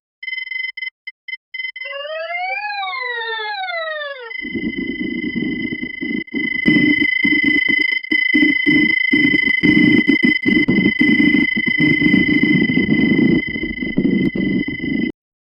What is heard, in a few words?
sci-fi fart robot malfuct; alarms